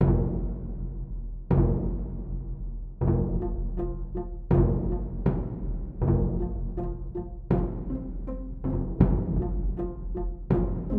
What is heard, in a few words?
Calm Music